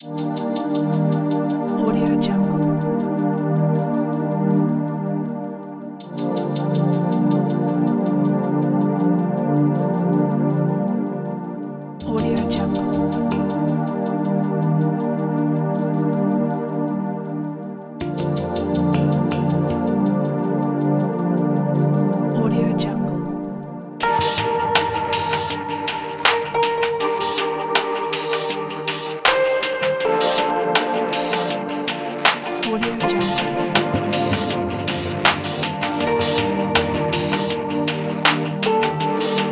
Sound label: chill soundscape